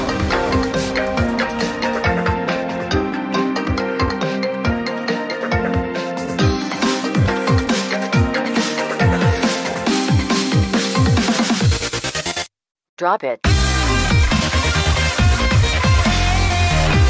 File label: sms ringtone; apple iphone 7s plus